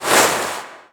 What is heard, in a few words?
Dolphin jump; RA3